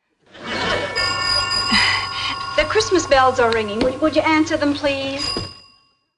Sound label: bells ringing, Doorbell; (telephone rings)(doorbell rings) [sigh] The Christmas bells are ringing. Would you answer them, please?